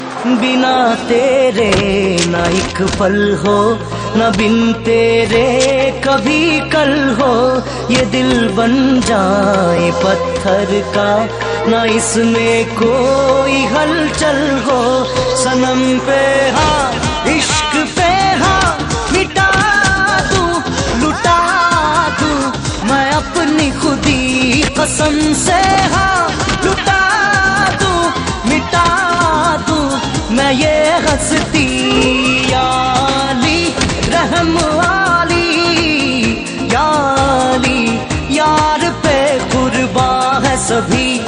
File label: Heart Touching Ringtone